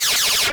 Science Fiction Sci-Fi Electronic Rapid Fire Laser